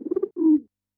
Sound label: BIRD, Yamaha DD-65